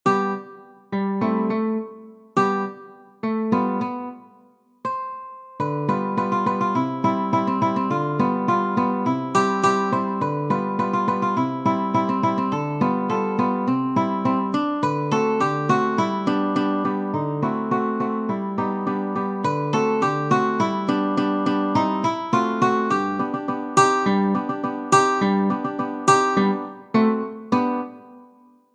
Pizzicato-Polka; Strauss, J. Jr.; Ballabili

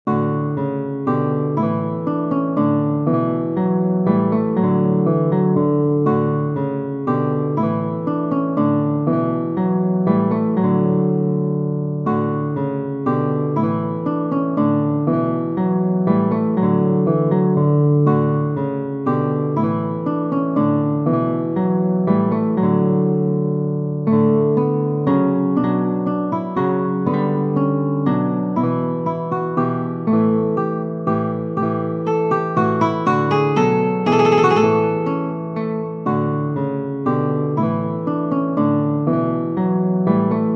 Menuet in D Major HWV 505

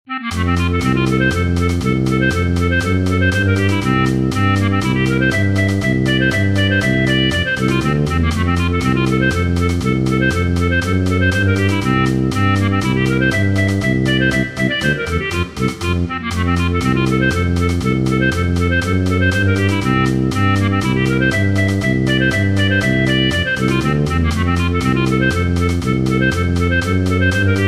Polka; Polka tradizionale originaria della Germania

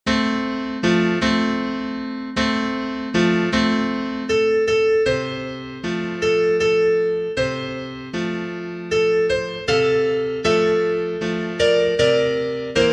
Wiegenlied (Brahms lullaby)

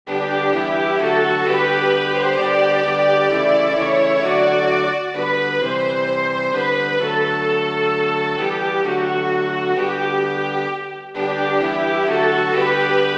Chorale; Cantata BWV 43

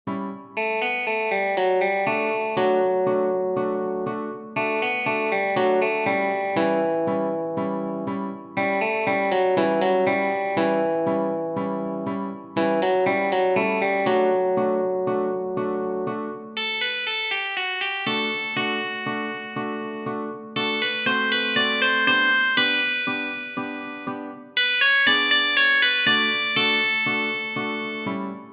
Tango; Ballabili

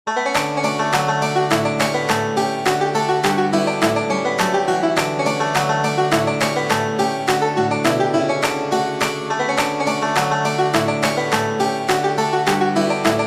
Hornpipe; Rickett's

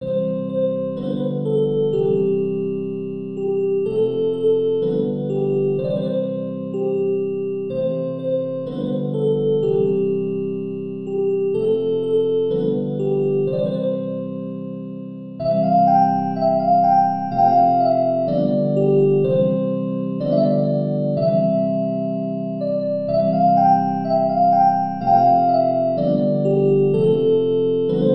Rigaudon in C Major

